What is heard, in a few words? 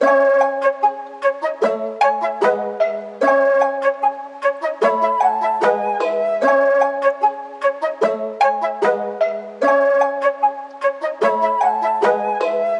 Melody Loop 150 BPM C# Min, Hip Hop; Melodics; Melody Loops; Cymatics; Cobra Hip Hop Sample Pack